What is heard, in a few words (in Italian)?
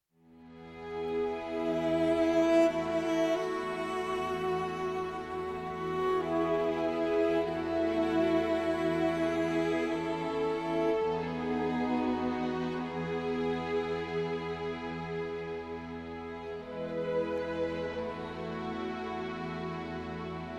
con cello